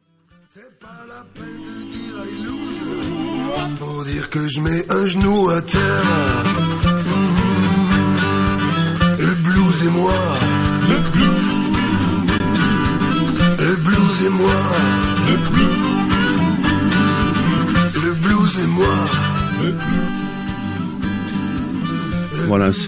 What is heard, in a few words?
laser blues train